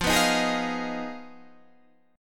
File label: F Minor 7th Flat 5th F